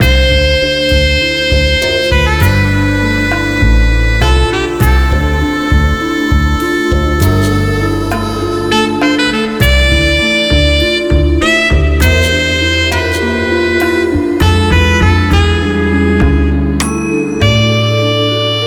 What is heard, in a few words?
jazzistic cave; mystic cave